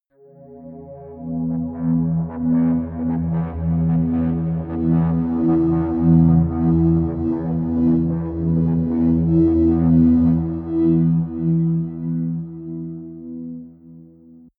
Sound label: atmospheric feedback pad low, drones; musikelemente